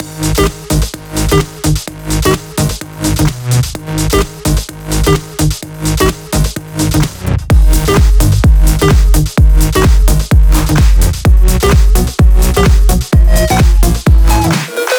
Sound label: Funkalicious Mix, 128BPM; Funkalicious Kit